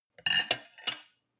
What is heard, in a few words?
cutlery on plate place soft; berufe; gastronomie; soundpool